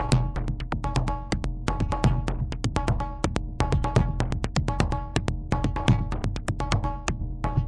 technological infirmity, drum loops and breaks, 125 bpm